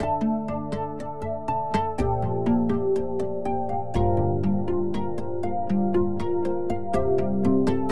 Pluck Pad Progression Dm 121 bpm, Synth; Loops; Tonal; Ableton Live 12 Lite; Core Library

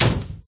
vehicle hit; There; ThereClient

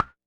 Clave, One Shots Drums Wood

